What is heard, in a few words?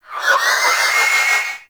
Sticky Ink Vocal Call; Shadowgrapher; Mutator